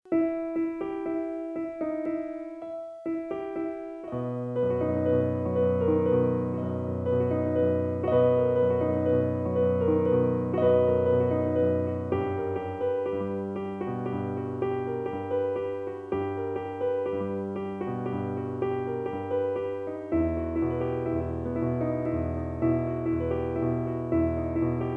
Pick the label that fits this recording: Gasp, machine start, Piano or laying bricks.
Piano